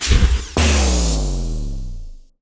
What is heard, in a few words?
drop mine; Allegiance